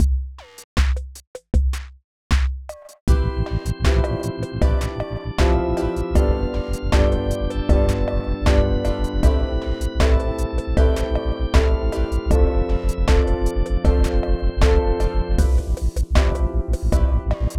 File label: 4 Chord Song